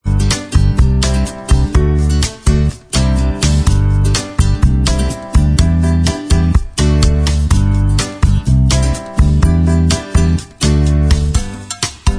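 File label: bossa mix, instrumental track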